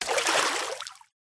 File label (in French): BRUIT TOMBE DANS L EAU; mirumiru